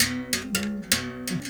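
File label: 79 bass berimbau phrase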